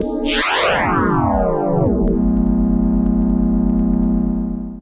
ODD, SYNTH FX; AMG; Global Trance Mission VOL-2

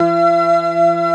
ORGAN, DANCE

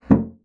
bassoon slap; Xsample VOL-6; C BASSOON

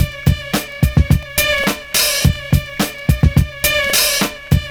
SLOW TRILL; Univers Sons

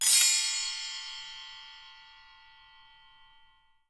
Percussion & Harp; Miroslav Vitous

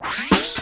tape fx, scratch; Ambient Grooves

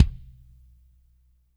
CMP KIK, DRY KIT; Spectrasonics; Burning Grooves CD2; DRY KIT 4

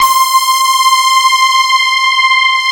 CMI PIANO; Wizoo; Powered DX

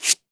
SANDPAPER

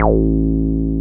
303 RES A2F, RES BASS

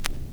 RECORD, Vinyl Scratches; E-MU Producer Series Vol. 8 – Platinum Phatt

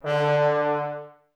brass, Woodwind Brass Ensemble

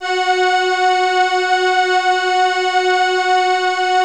CRUMAR; Masterbits - Vintage Voltage; CRUMAR PERF

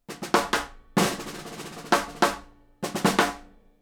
SNARE, Live Snares

